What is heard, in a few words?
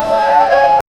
fairground sounds include; amusement park